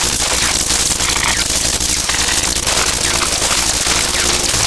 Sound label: lightning idle, weapons